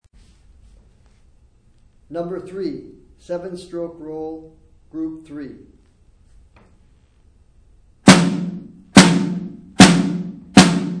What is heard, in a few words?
snare rudiment